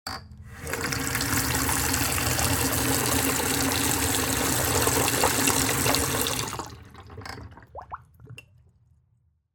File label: Household Faucet Bathroom Sink Faucet Turn On Medium Shut Off Short